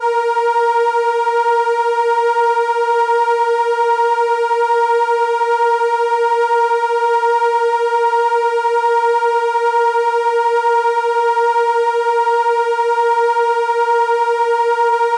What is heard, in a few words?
violin A#4, ARP Solina